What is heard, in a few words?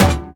idle shell impact; smite; kuzenbo